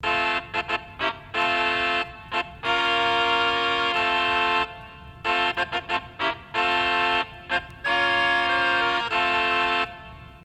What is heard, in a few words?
Test Bpm Sync Music 4 bars; PS4; Tearaway Unfolded